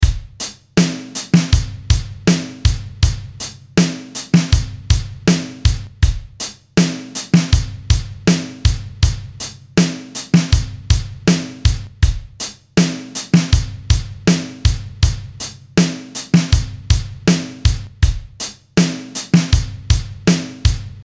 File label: Blues Riff A 80 BPM